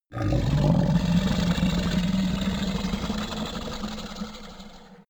minotaur grunt; kreaturen

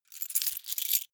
key ring large rattle; objekte; schluessel

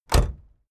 car camaro close door

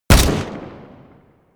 rifle close shot; comedy-cartoon; pistolen-gewehre

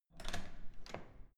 security door open

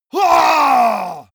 monster growl attack throaty; schreie

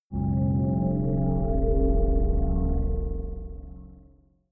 sci-fi saturn night, drones

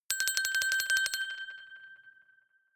glockenspiel sparkle tremolo high short

